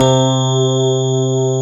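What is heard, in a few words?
grand piano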